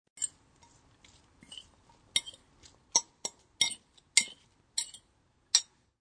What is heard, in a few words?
Silverware in Bowl, Silverware clinking in a bowl; Glasses